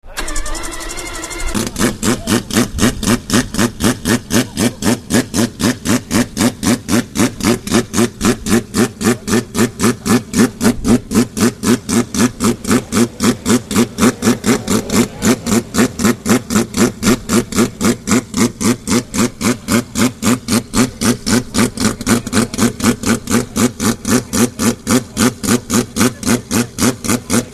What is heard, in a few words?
787b, Mazda 787B Sounds; Le Mans Champion (1991); Mazda 787B mp3 sounds